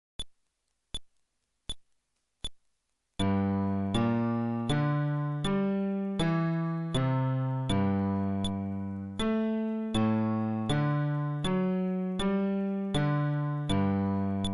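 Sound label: Arpeggio テンポ80, フレットレス bass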